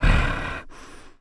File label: Weakened Orge Idle